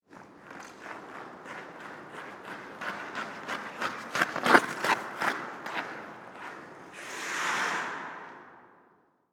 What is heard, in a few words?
Ice Skates By, Pass, Fast, Stop, Indoor, Ice, Rink; Sports; BlastWave